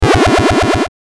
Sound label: ninja, saw; sfxr